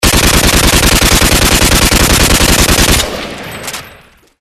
Gun AK47 Auto

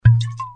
Wine Bottle Open

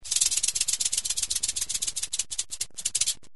Rattle Snake

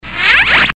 swisher

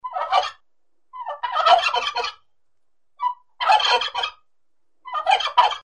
Turkey Gobble

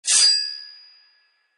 Knife Slice

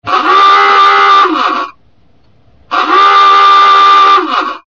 Submarine Klaxon

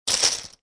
Poker Chips Bag

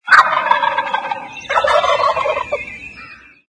Turkey Gobble

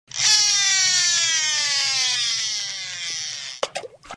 Fishing Pole Casting With Splash

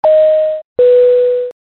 Airplane Seatbelt Chime

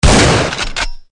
Shotgun Shoot Reload

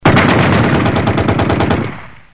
Machine Gun

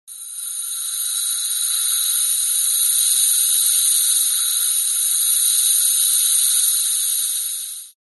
Air Leak

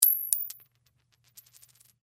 Coin Drop Concrete

